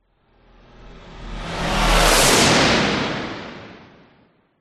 science fiction car vehicle drive zoom past engine rev